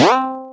spring; sonic

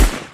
scar shot; fortnite

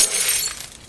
glass breaks

